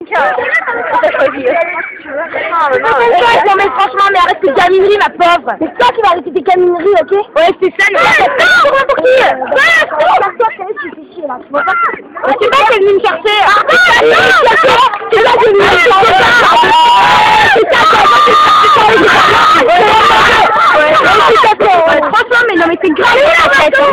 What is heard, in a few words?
ambiance recreation